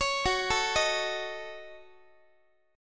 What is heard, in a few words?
F sharp Minor 6th F sharp Guitar; F#m6 arpeggiated; x,x,16,16,13,14